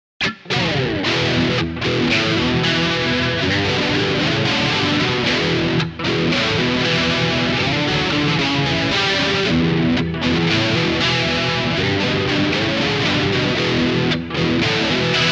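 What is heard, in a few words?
MAXON CS550 tremolo chorus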